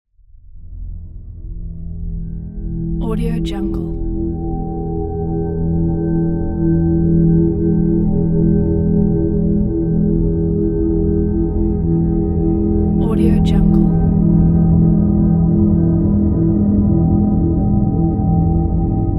atmo drone egyptian low; smartsoundfx

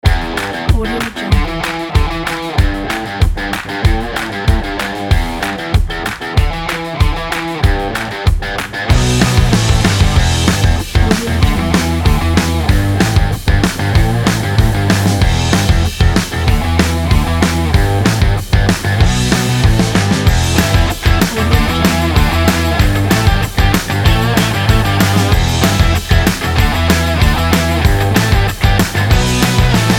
energetic action rock

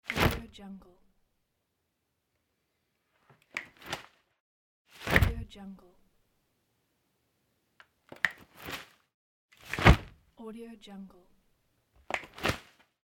umbrella open and close

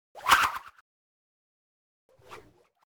swish twirl short spinning object fast spurts

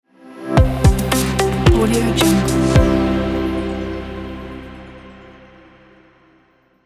technology electronic digital news logo

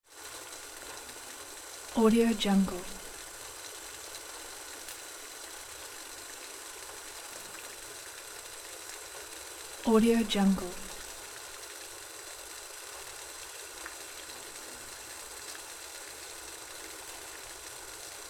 boiling water

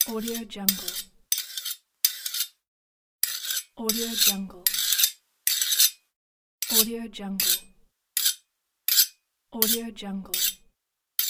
blade sharpening

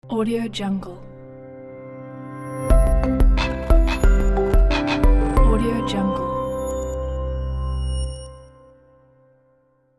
minimal logo

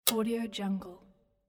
typewriter ribbon selector switch

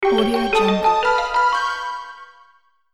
cartoon horror suspense

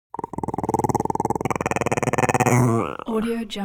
animal dog chihuahua growl strained; smartsoundfx

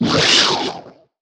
excavator exit vox; monster within; soundmorph

